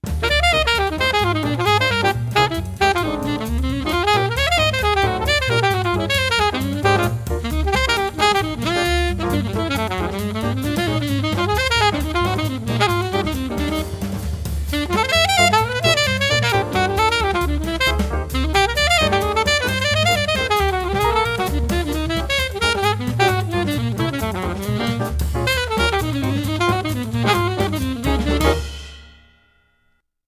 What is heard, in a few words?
etudes alto; state street